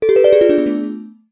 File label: Save Menu Continue No